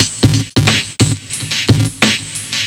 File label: Beats, Synchro Funk; Awash; Sonic Foundry (Sony Creative Software)